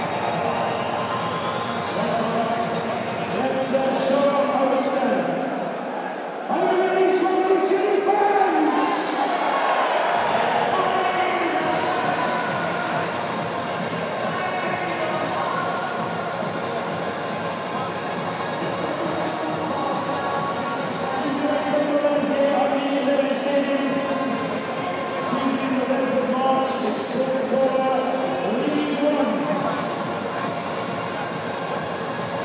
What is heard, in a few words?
swansea city fc pre match atmos